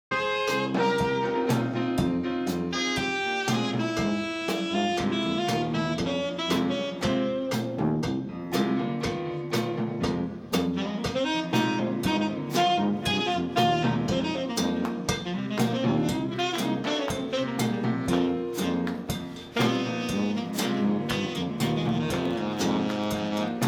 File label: funky sax